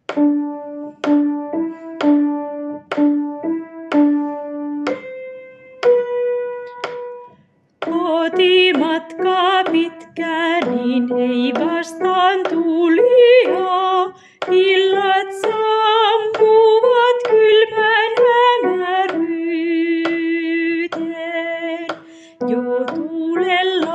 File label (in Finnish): Syyslaulu, sopraano